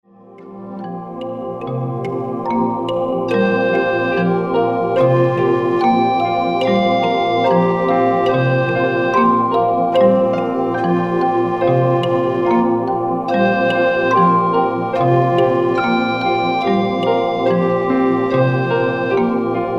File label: new age relaxation